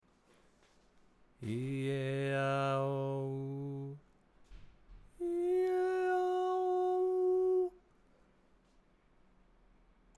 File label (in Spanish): Vocales en agudos male